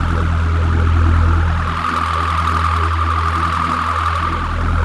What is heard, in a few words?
Phaserdom; SFX & One-Shots